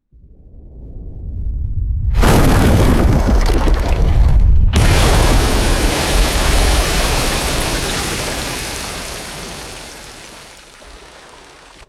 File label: deep blast and heavy water splash; explosions